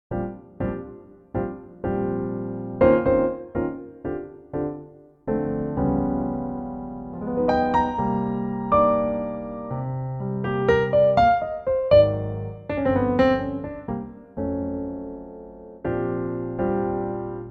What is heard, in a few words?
Bb Piano, female